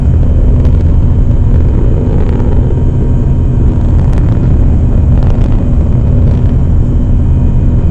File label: engine